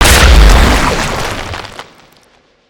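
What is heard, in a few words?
Overcharged Blaster Plasma Impact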